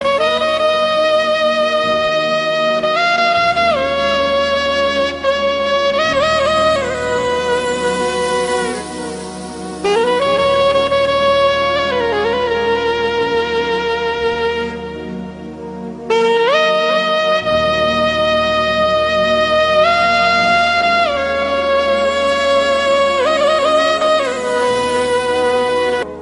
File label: instrumental song ringtone